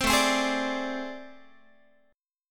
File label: A Minor Major 7th Double Flat 5th A, Guitar; AmM7bb5 strummed; 15,17,15,18,18,x; 4A,4E,5A,5D,5G#,6C#